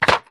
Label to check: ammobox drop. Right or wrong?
Right